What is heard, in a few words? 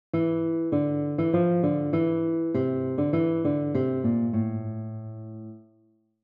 phrygian